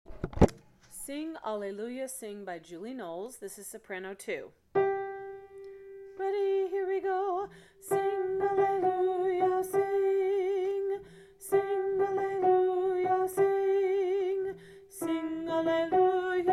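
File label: sing alleluia sing soprano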